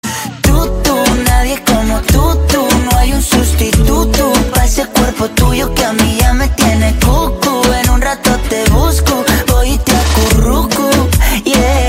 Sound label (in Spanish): nuevo tono de llamada español